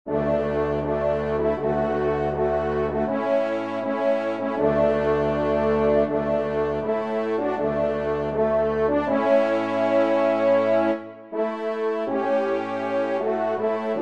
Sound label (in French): Hymne a Saint Hubert